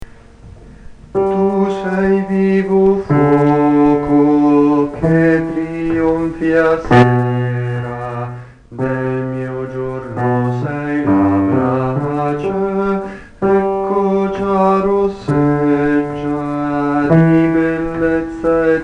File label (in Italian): bassi; Tu sei vivo fuoco